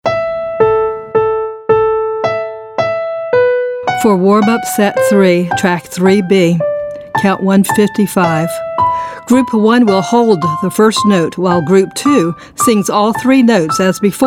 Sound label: Warmup Count Hold